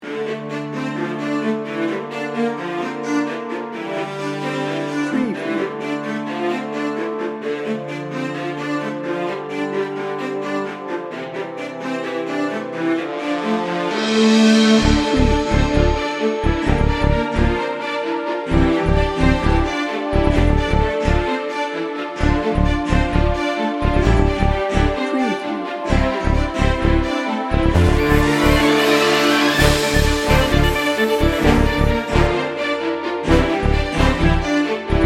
heroic power no drums

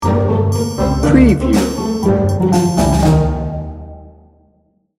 cartoon adventures sting bumper

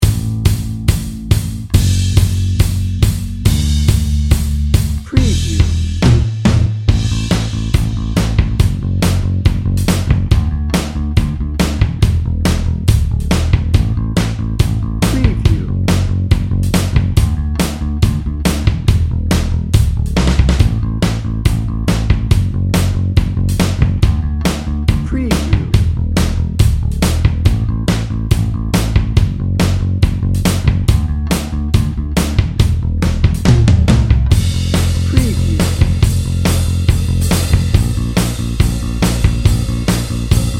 happy pop rock

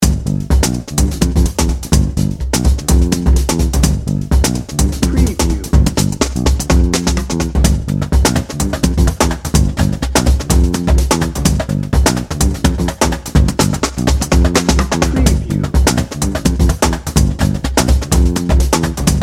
hectic hip hop dnb

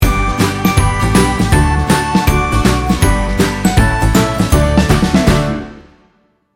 sunny spot sting bumper

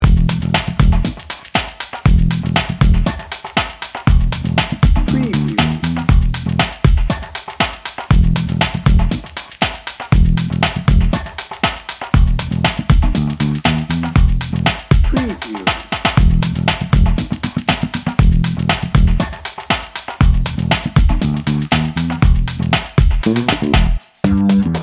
funky lickin good

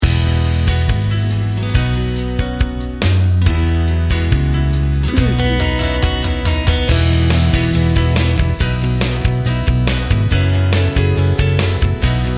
optimistic pop rock

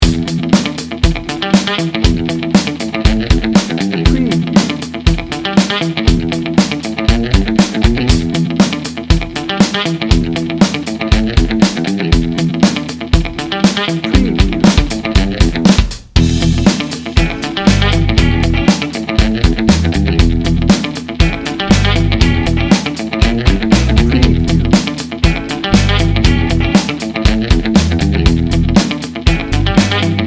funky guitar bounce

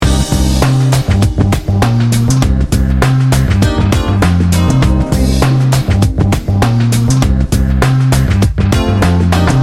smooth and bouncy loop

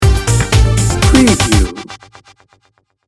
party in motion sting bumper

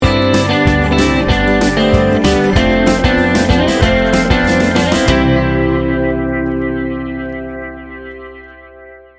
home grown sting bumper

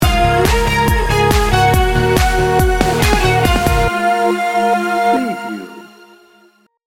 dancing divas sting bumper

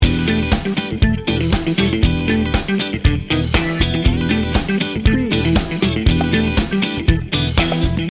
funky toes loop

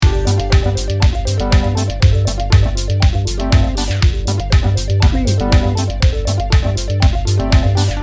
technology pulse loop

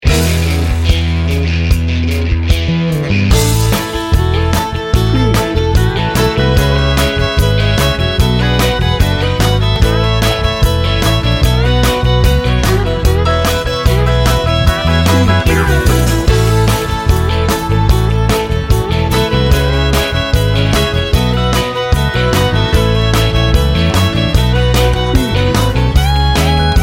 country bar

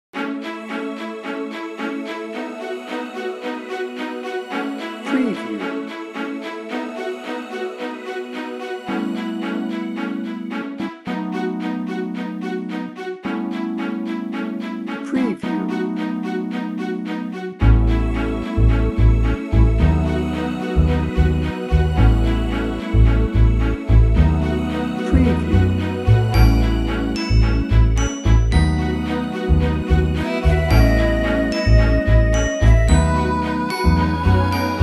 cool comfort no drums

